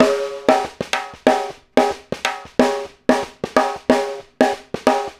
Snare Pattern, Drums; Essential Percussion

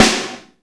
ROOM SNARE

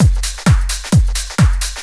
drum 130 dance, drumloops

